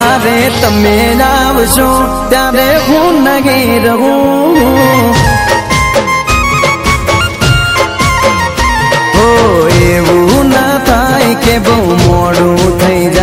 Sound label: gujrati ringtone